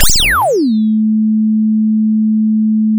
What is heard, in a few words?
sound of a bike bell